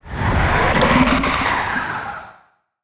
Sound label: Zombie Throat, Monster Sounds; The Decline Of Warriors; Monster Sounds Volume II